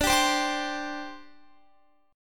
D 7th Suspended 4th, down, Guitar

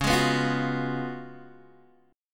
C sharp 11th C sharp, Guitar, Open G

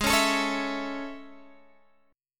A Major Ab; A/Ab strummed

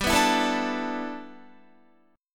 G 6th Add 9th G Guitar, chord; G6add9 strummed

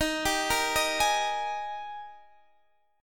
Ab Major 7th Suspended 2nd Eb

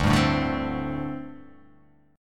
D 7th Suspended 4th D

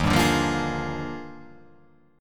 Eb Major 11th, chord; EbM11; strummed; JGuitar

